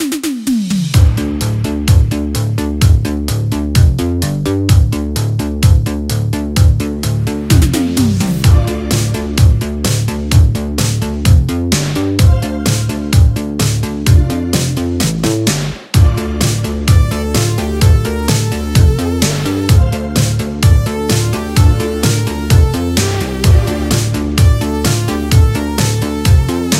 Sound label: 80s POP; Production Music